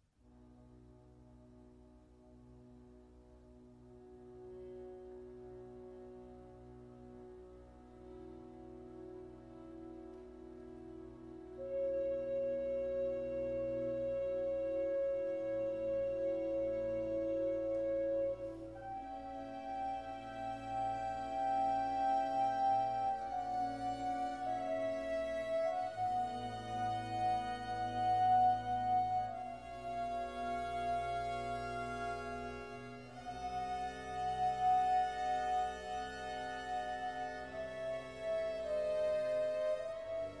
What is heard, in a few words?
Concerto for Flute, Strings & Basso Continuo in G minor, Largo; Op.10 No.2 La Notte; 비발디- 플루트 협주곡